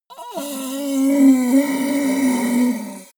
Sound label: yeti groan pain weak; kreaturen